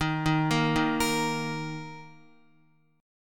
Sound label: D sharp 5th D sharp, Guitar; arpeggiated; x,6,3,3,3,6; JGuitar